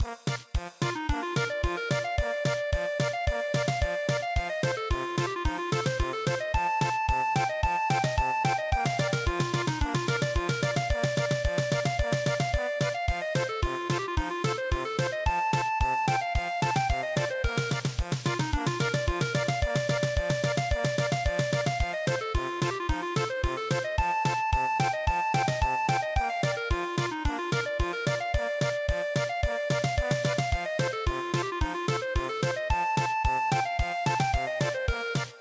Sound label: CLARINET POLKA German Polka